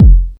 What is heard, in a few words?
127 DRM, Fresh Disco House; USB Soundscan vol.20 - Fresh Disco House I